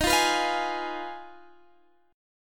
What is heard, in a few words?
B 6th Flat 5th E flat, guitar chord